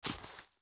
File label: FS Weeds, footsteps